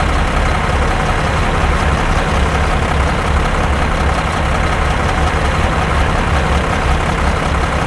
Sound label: BTR80 engine idle; DCS